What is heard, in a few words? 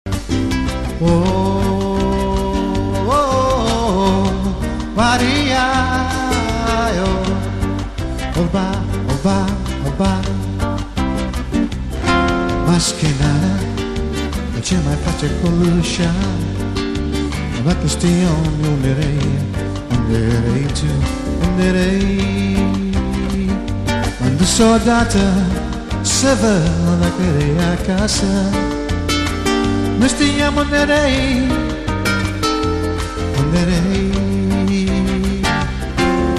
Latin Jazz